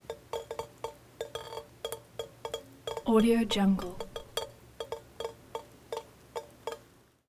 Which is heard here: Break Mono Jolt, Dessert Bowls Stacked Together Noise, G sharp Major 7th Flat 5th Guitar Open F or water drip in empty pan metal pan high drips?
water drip in empty pan metal pan high drips